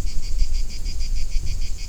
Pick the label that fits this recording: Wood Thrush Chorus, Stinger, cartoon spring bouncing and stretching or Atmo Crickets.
Atmo Crickets